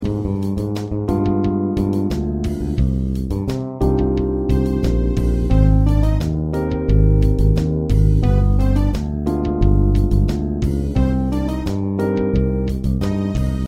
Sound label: Accompaniment